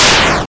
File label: Explosion